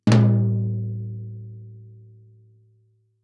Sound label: Toms; Touch Loops; CosmicFunk